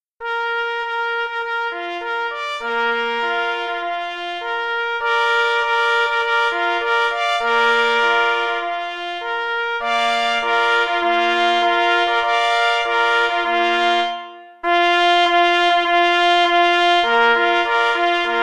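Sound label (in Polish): Hejnal Lesnikow Polskich, zespol rogow mysliwskich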